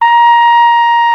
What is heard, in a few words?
Flugelhorn; Roland L-CD702